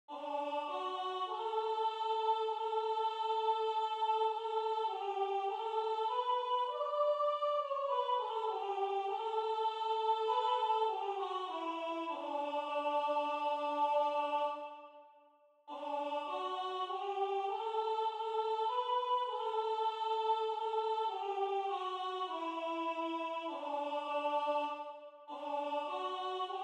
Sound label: Pentecost Alleluia Voice